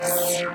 Laser

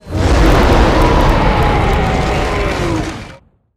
Duckbill Monster Roar Long

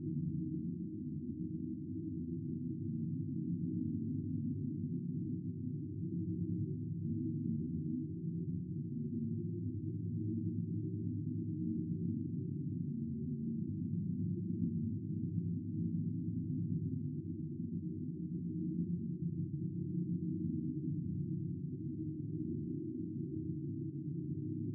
Tunnel Ambience 150hz Like Spaceship Corridor

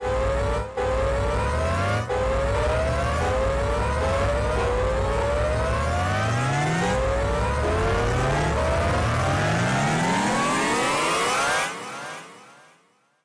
Speeder sound

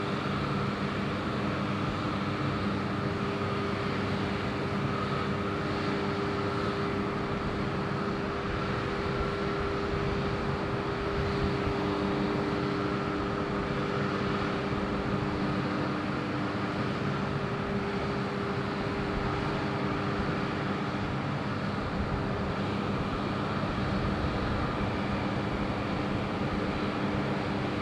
Industrial complex

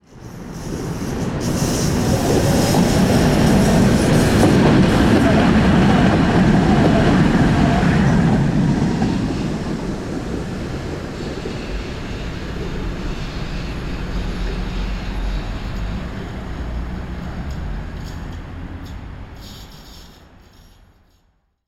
Tram

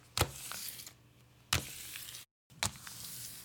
Grab Snack From Table

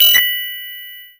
Digital Mallet G 5

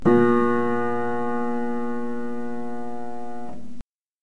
Piano

